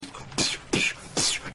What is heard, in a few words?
gun sounds